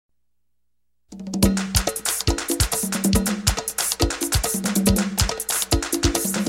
Tambores

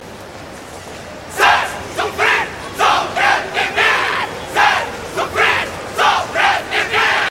Ambiente calle

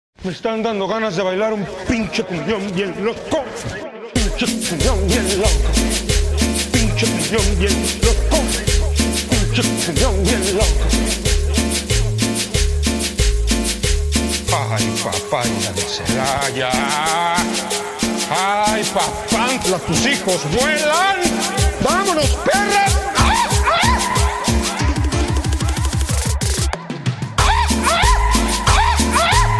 Cumbion

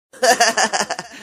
Risa de

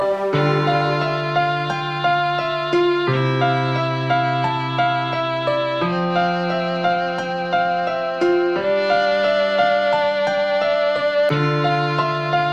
Melodia

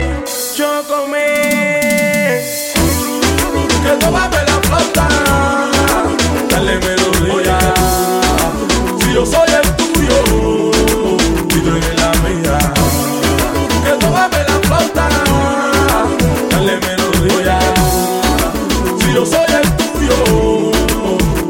La Flauta

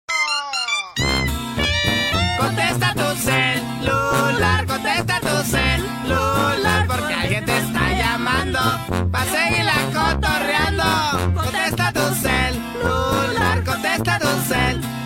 La Cumbia

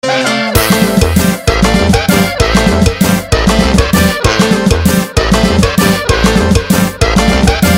Huapango zapatazo

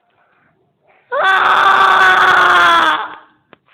Grito miedo

